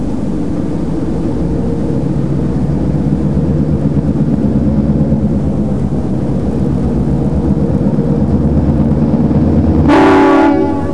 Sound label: pass train